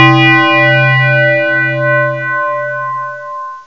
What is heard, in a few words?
church bell